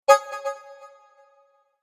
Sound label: Digital Chime Signal Vox Click; Digital Clicks, Blips & Alerts; Digital Sound Effects